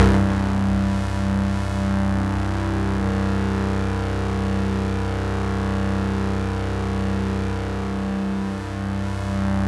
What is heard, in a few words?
C2, Synth Line; 90 s Sample CDs; NiceBeats; SynthLine VOL-1